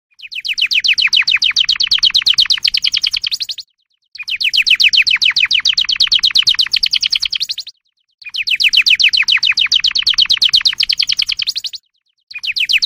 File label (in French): Oiseaux du Canari